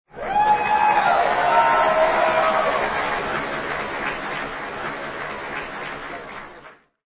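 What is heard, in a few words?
big crowd shouts applause; Bolshaya tolpa kriki aplodismenty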